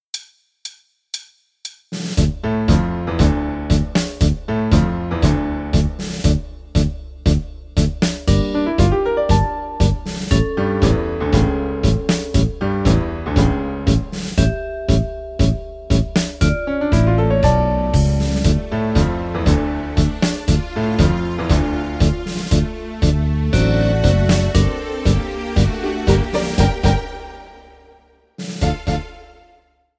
TANGO base

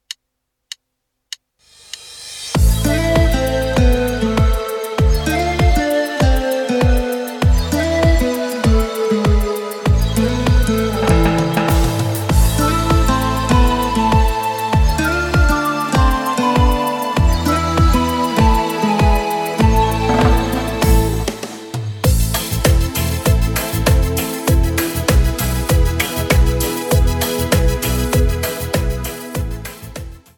cumbia

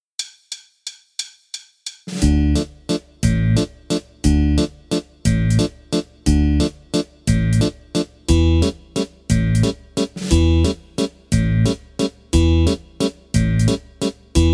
valzer musette, base

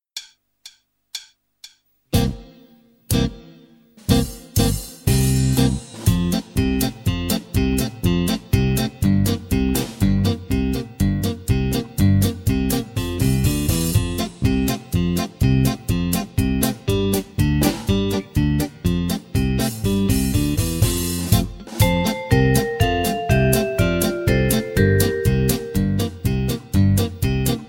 base, polka

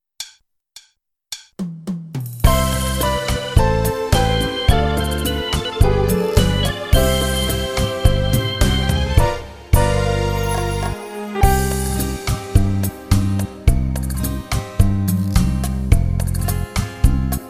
rumba, base

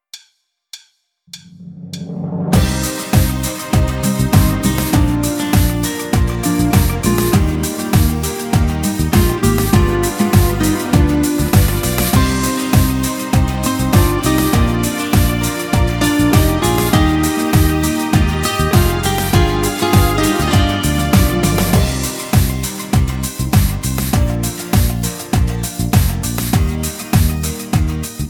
FISA IMPERIALE base, cumbia

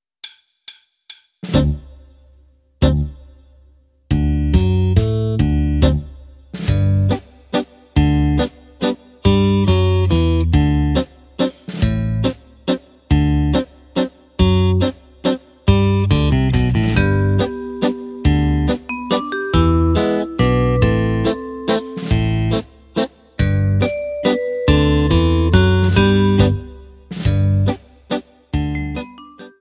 Mazurka BASE SENZA SAX

